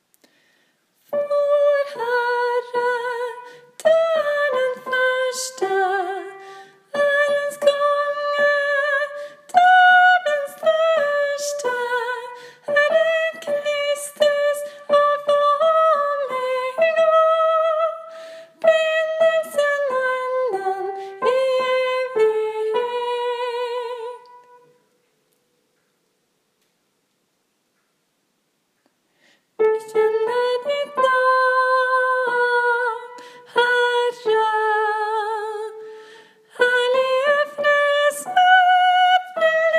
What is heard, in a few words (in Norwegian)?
tenor; alfa-og-omega; åfjord